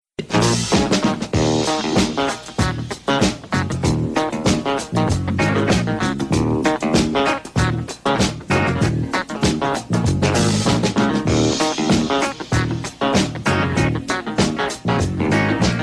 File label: jazz beat ringtone